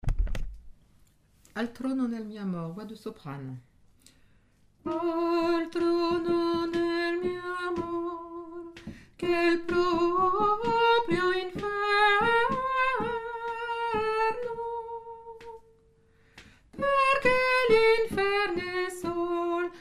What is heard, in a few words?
soprano